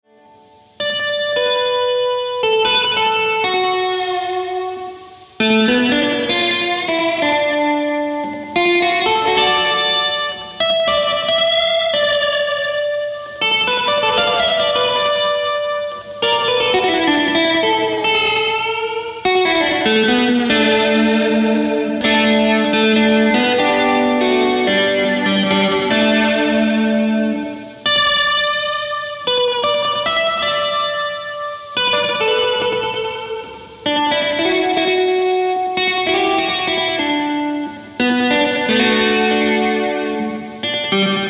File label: Hohner Clavinet D6 Space-Echo Amp